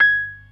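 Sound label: PERC C sharp 3; Best Service; Advanced Media Trax Modern Composer; B3 FULL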